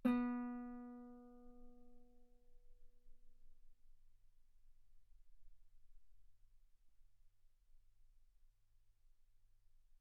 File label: Guitar ff sul B B3; single mic